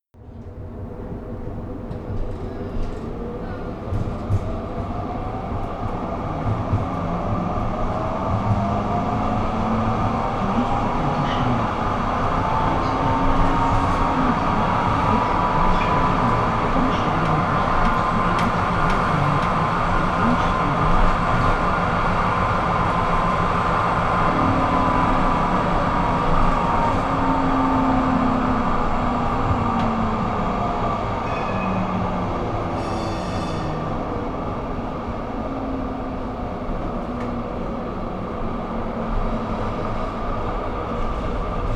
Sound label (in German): Fahrsound P Wagen